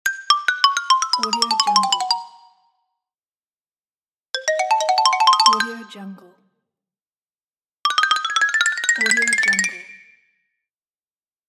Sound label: cartoon xylophone